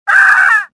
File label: death, worker; worker1